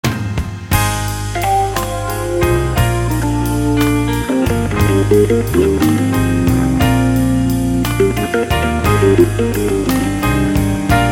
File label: organ